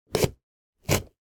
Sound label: pumpkin cut knife halloween; foley; sound effects; sound effects one